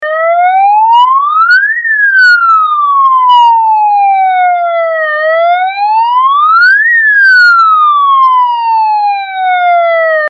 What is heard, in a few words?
police